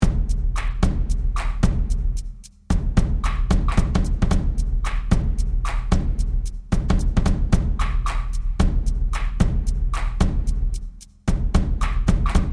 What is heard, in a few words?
taiko groove; JSO elements